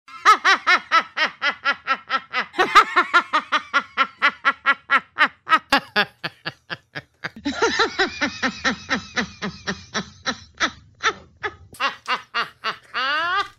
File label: laughs